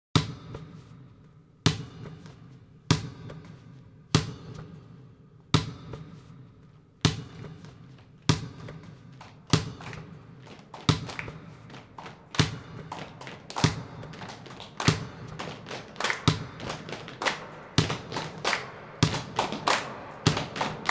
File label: Gallery Workshop Soundscape; Zack Bent